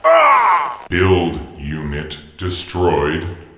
argh; builder; allegiance

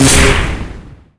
shield hit; allegiance

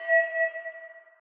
fuel low; allegiance

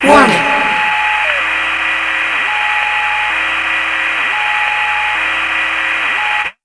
warning; allegiance

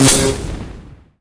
shield hit; allegiance

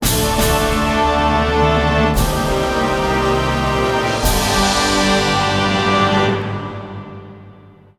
death music; allegiance; redbook

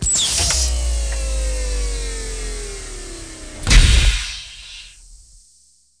landing; allegiance

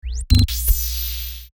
drop mine; allegiance

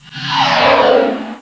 torpedo; allegiance

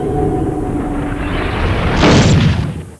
jump out; allegiance

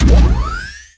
powerup shield; allegiance

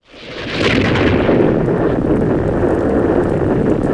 missile; allegiance

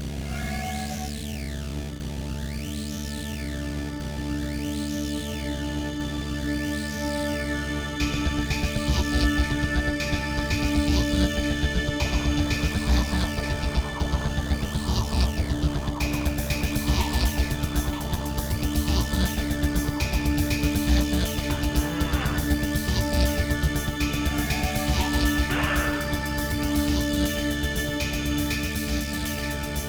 techno; allegiance; redbook

